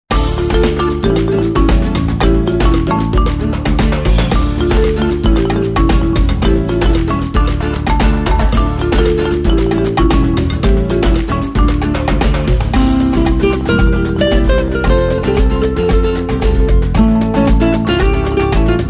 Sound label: music